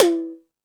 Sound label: Dance, Drum Machines